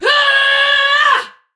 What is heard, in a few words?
Attack Effort; Warframe; Tau; Dax; Ninja